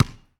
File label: Street Ball Bounce Concrete